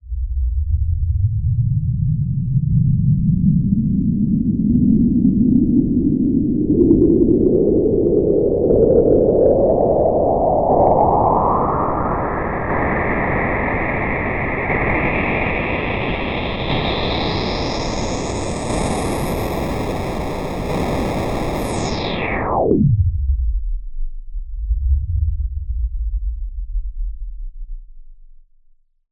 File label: Buzzy Rumble; Freds Lab